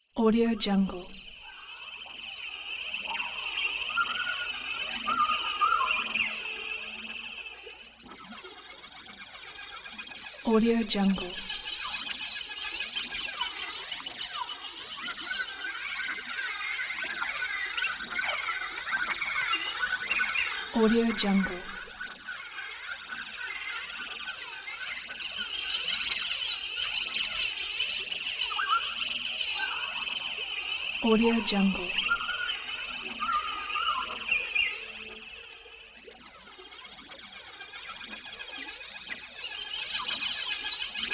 jungle ambience

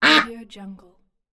duck; crisstyna

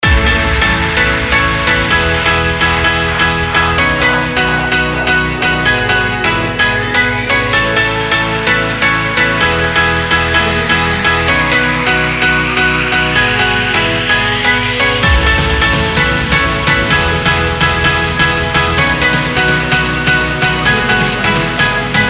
house party pack

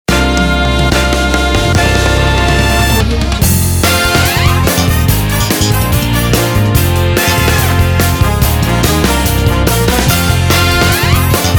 opener for shows; mark-music

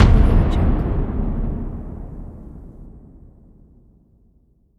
the bomb explosion

